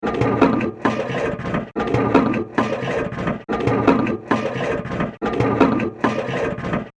Evil Machine Loop; horror; Evil Sound Effects

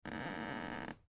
Floor Creak Wood, Floor Creak Wood Board Step; Creaking; Household; Blastwave

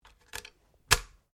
RIFLE ANSCHUTZ 67MSR 22 CALIBRE MAGAZINE ENGAGE; A selection of domesttic firearms; Rifles

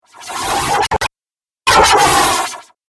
Dubstep Scifi Effects

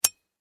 Household Lamp Switch Off

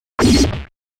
Shine Harsh Distortion Blip; Production Element Imaging Element Accent Transition; Blastwave

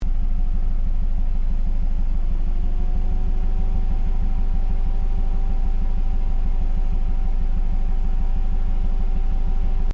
Car Ford Focus Idle Low Exhaust; Ford Focus hire car; Cars; Vehicles; SoundEffects-Foundation1

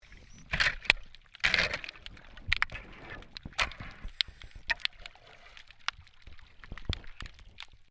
MAINTAINENCE WORK UNDERWATER SHOVEL SCRAPING

